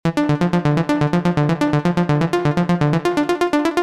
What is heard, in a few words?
Pluck Melodic Techno Vital